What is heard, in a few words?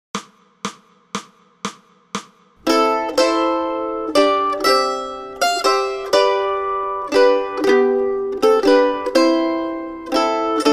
Mandolin; What Child is This?